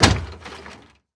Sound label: Impact Gate Wooden Gate Hit With Chain Slide